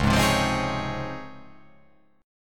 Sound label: Db Major 9th; strummed; JGuitar